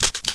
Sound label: switch burst, weapons; hl2